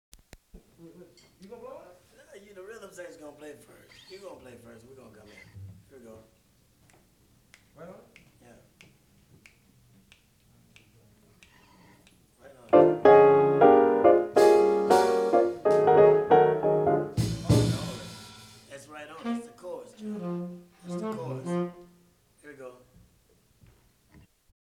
Blues

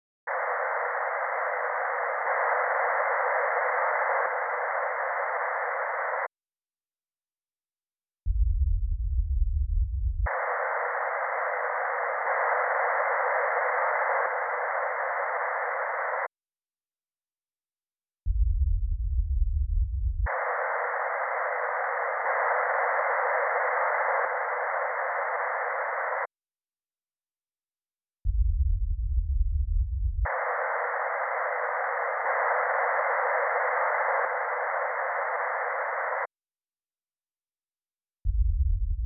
Surround-Sound speaker balance with subwoofer; Surround Sound Setup and Imaging; Surround Spectacular

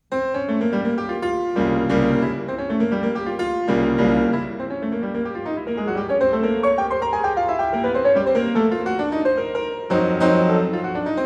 Preludes for piano, No. 18 in F minor; Chopin Waltzes 4 Scherzos 26 Preludes

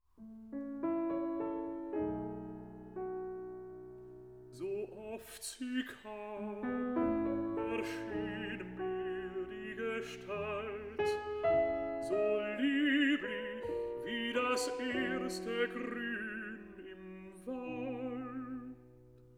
Songs for voice & piano; Lenau; No. 3. Kommen und Scheiden; Schumann

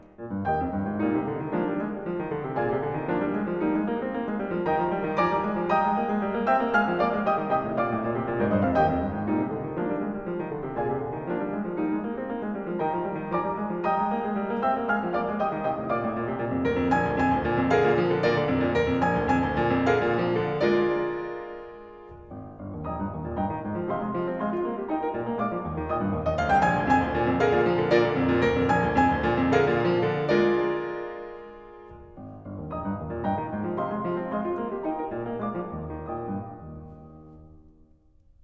Variations and fugue for piano in E flat major; Beethoven

